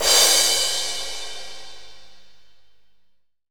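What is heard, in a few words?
Kit Heavy crash; CMI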